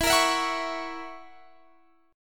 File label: F sharp 7th, guitar